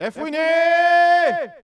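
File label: men yell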